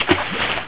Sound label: splash; sub war